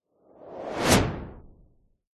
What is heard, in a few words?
sound design fast whoosh; zapsplat